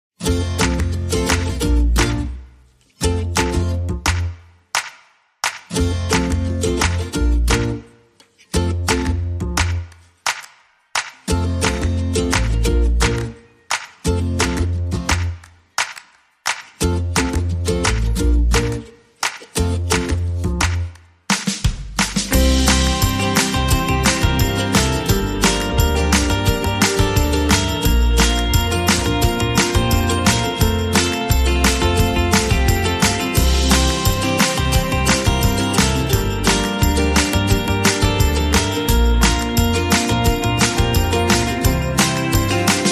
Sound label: No Plinky Pizz No Organ